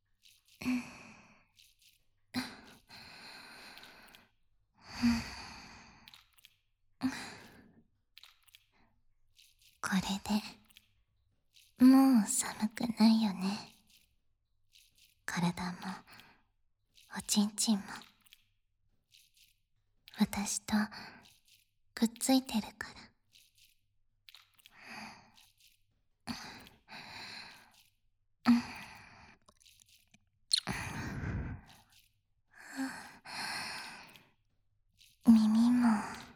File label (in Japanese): 耳舐め手コキ SE控えめ, ASMR; 耳舐め手コキ-その1